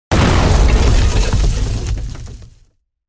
Hand grenade; ضامن دار; نارنجک دستی

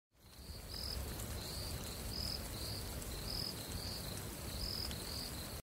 forest at night

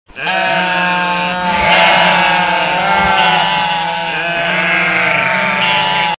flock

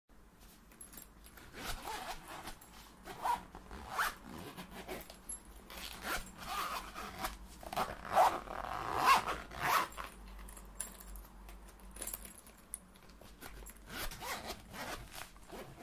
Pencil case

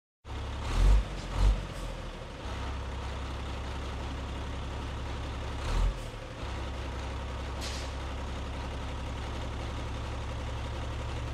Loader

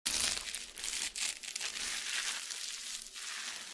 sponge; اسفنج خیس

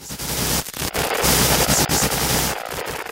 Tv Noise

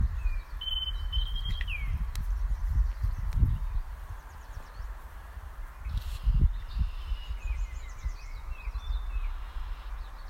Northern Oriole; پرنده اوریول شمالی

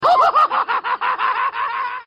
laughing; pennywise

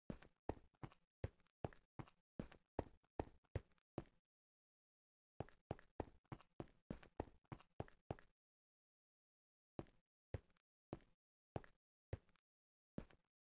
Walking Minecraft

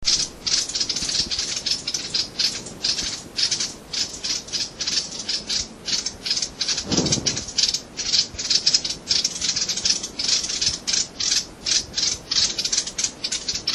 Peruvian wren; پرنده الیکایی پرو

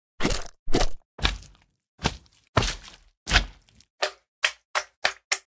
Slimes Minecraft; اسلایم در ماینکرافت

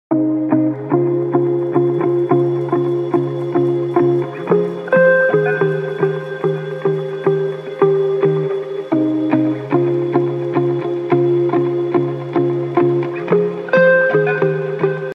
Fantasy Ringtone

